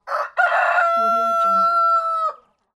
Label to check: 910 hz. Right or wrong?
Wrong